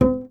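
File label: string contrabass; Miroslav Vitous; Solo Instruments VOL-2; Solo lnst. II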